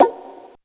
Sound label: SP perc hit; E-MU Producer Series Vol. 8 – Platinum Phatt; SP1200